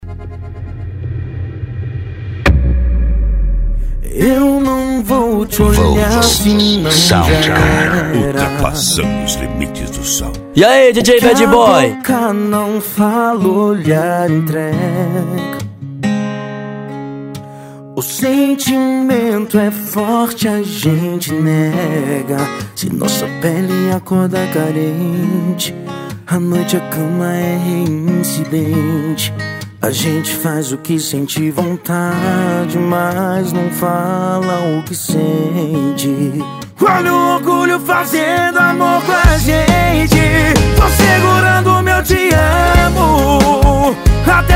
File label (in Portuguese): sertanejo e pisadinha; cd volks sound car